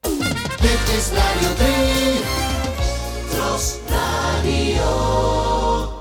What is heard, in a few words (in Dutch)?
omroep jingles